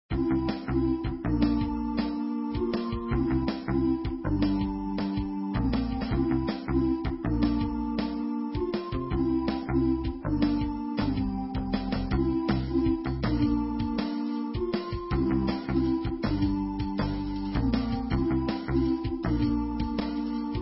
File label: menu; inlogic-birdyrush